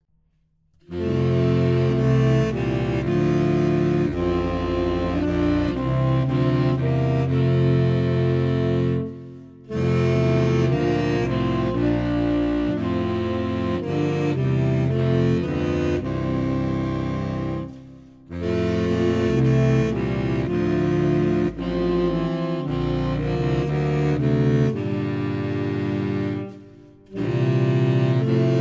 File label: Saxophone Quartet; Eventide